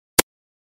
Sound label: hitmarker